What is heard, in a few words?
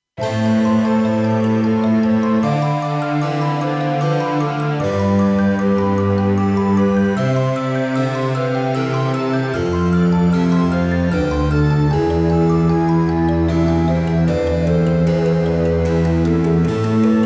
Menuett